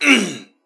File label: Swing; doctor